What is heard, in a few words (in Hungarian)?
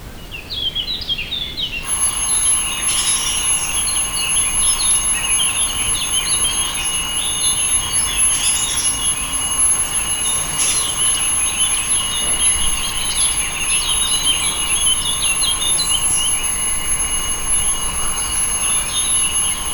riasztó, vízátemelő szivattyú; perkupa